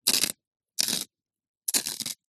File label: sharpening new pencil with small plastic sharpener